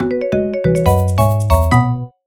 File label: Intro Win; Alphaset by POWGI; PS Vita; Xbox Series X/S